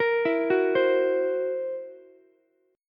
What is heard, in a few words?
Fsharp 7th Flat 5th down Ukulele; arpeggiated; JGuitar